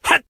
kihap

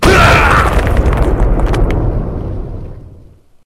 Monk land

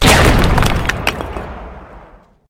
energy fire

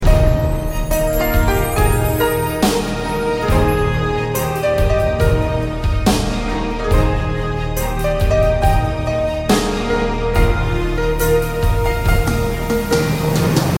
Victory

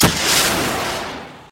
fire

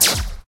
energy

